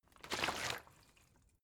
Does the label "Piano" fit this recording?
No